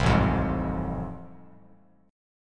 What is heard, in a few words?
A Minor Major 7th Sharp 5th A, Bass Standard; AmM7#5 strummed; 8,0,3,1